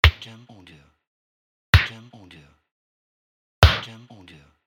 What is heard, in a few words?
Fist Punch